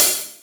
drum hit whistle; osu!

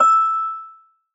soft hit whistle; osu!

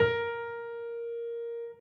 soft slider tick; osu!

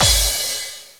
normal hit finish; osu!

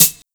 normal hit whistle; osu!

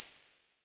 drum hitwhistle; osu!

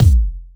drum hit normal; osu!

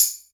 soft hit normal; osu!

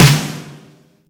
soft hitclap; osu!

